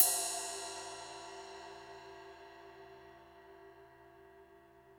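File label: CYM RIDE, ride cymbal; Sound & Vision - Gigapack I; mono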